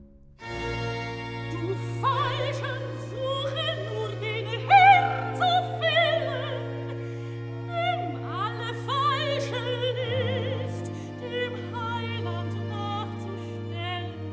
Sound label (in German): Recitative, soprano; Du Falscher, suchet nur den Herrn zu faellen